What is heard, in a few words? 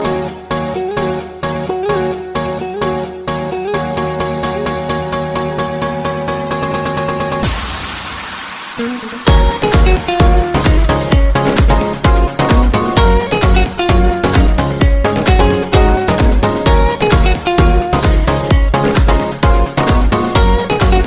spanish guitar house ringtone